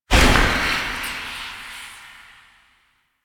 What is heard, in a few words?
Tailor Shop Door Fade; Interior; Warframe; Nineteen Ninety Nine